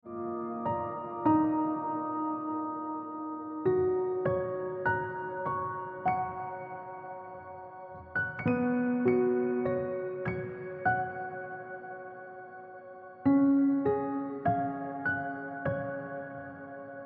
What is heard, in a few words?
Piano Music